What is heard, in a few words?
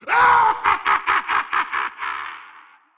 wheel laugh; dota; razor